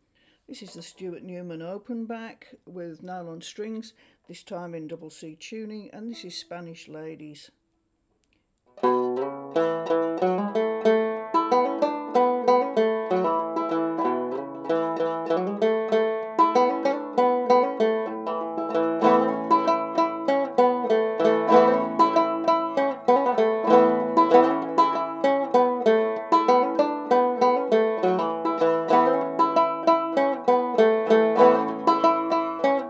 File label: nylon, banjo; stuart newman; Spanish Ladies